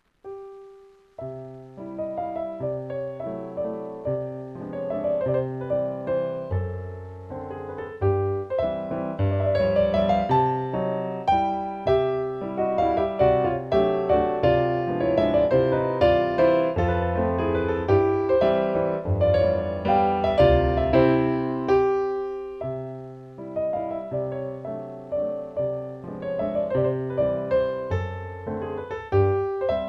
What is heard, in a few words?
Mazurka; F. Chopin